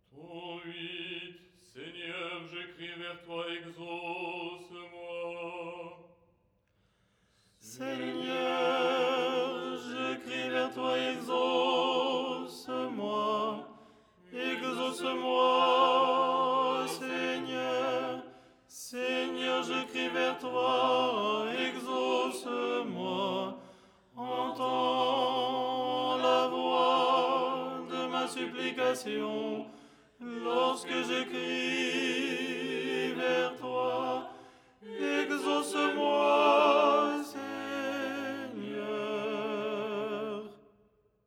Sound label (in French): tenor